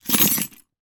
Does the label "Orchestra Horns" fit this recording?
No